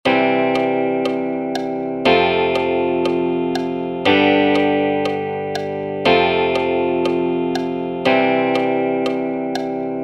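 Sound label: 1 4 5 exercise, guitar; theory and technique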